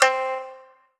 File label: Korg NS5R Shamisen C4